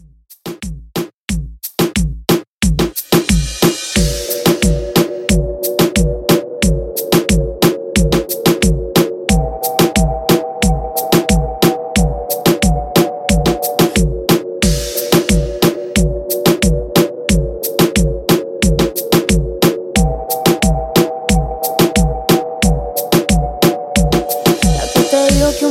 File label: Reggaeton 90Bpm